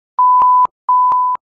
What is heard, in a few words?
added to call